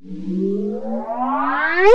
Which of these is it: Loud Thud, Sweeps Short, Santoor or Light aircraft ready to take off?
Sweeps Short